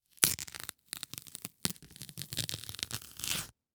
Household Banana Peel

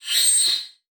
Multimedia Internet CD-Rom Flash Wipe

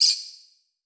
Multimedia Internet CD-Rom Flash Beep Mechanical

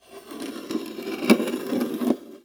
Impact Wood Board Drag On Wood Surface; Impacts

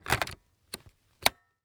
Technology Electronic Video Camera Insert Battery

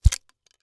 Weapon Slingshot Shooting; AdobeCC

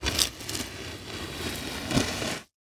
Foley Movement Stick Dragged Through Dirt

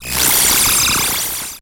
Title Transition Digital Readout; Adobe; Production Element

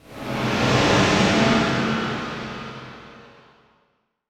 Transition Whoosh Medium; Production Element; Title Transition; AdobeCC

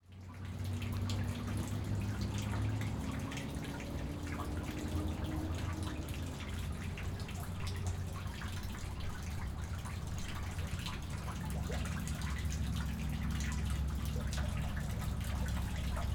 Ambience Sewer Drain; AdobeCC

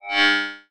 Beep Low Pitch; Multimedia Internet CD-Rom Flash

